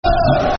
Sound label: Football Chants (Soccer Songs), Wigan; Scharner; Wigan Wafc